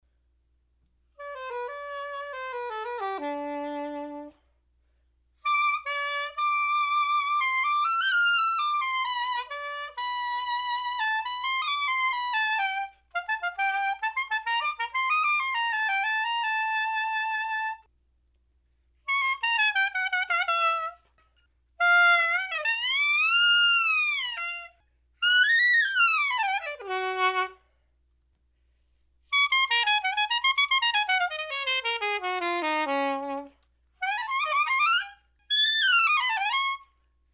sopranino

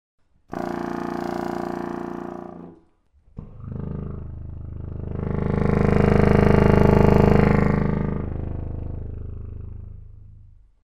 Contrabassoon Vs Tubax Low Note

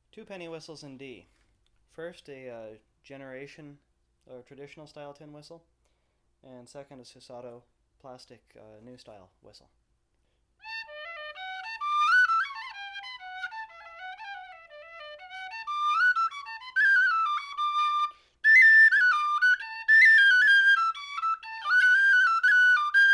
whistles

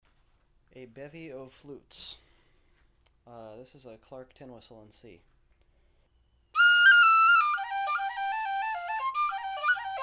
folk flutes

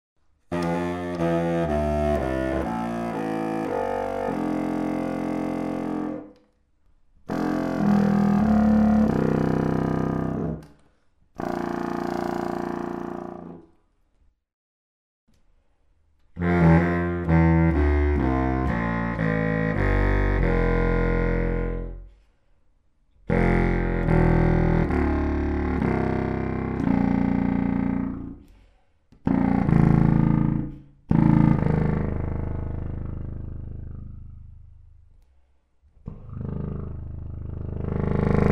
Contrabassoon Vs Tubax

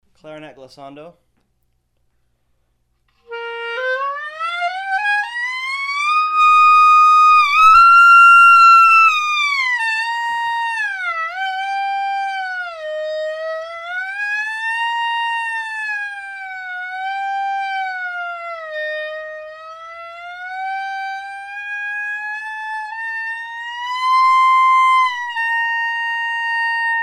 Clar Gliss

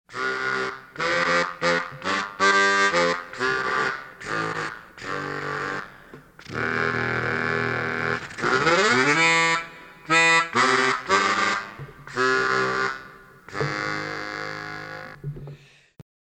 Contrabass Harmonica

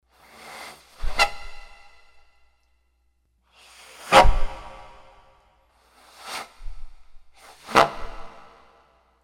industrial Steam Vent Noise bari

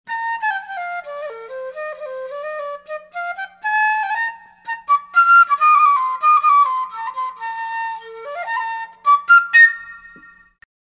Bb Fife

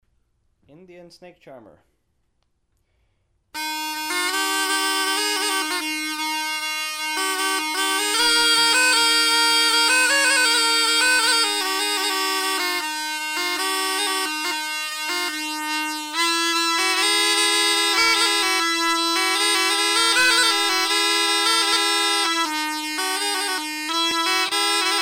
snake charmer

